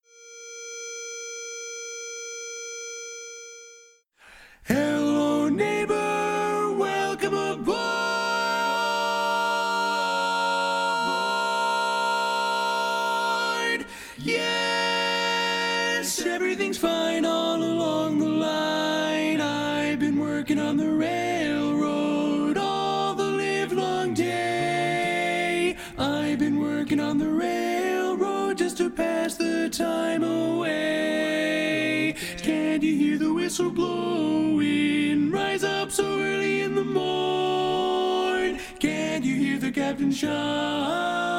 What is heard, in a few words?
Tenor, I've Been Working On The Railroad; Polecat II